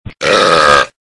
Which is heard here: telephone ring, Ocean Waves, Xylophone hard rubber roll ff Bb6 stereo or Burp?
Burp